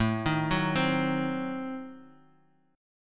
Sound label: A Diminished 7th, Guitar Open D; chord